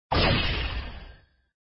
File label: shoot